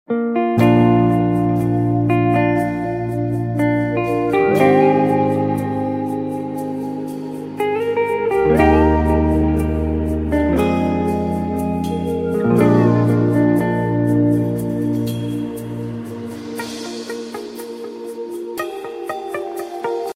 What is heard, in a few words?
instrumental ringtone; udta punjab